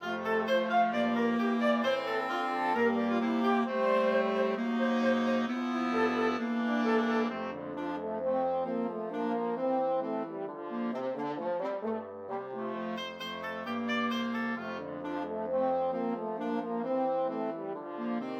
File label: Shepherds Pipe Carol